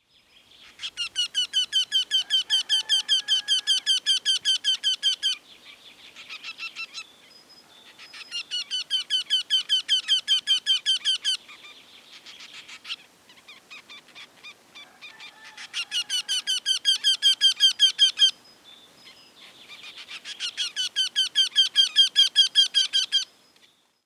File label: Jynx torquilla song short Wendehals; Klaus Conrads Tierstimmenarchiv des Museums fuer Naturk